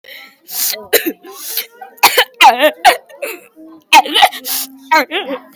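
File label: crying sniffs coughs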